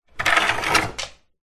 household window blinds venetian wooden pull open or close; zapsplat